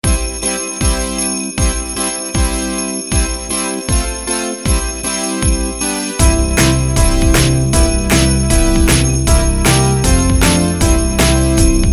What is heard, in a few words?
sleighbells jinglebel; ap sleigh bells; audio perception music